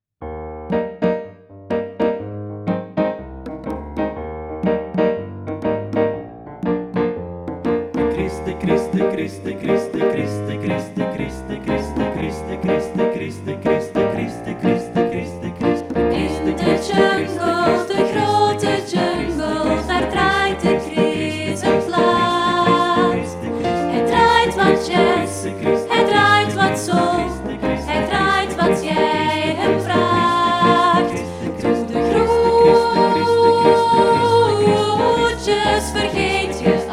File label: Jungle Jingle